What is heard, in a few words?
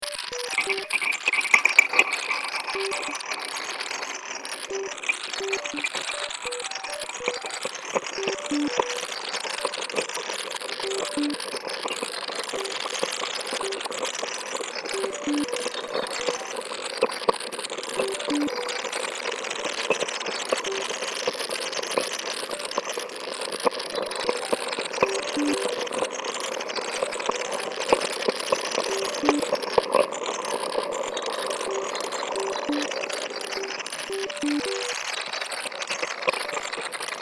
wobble grind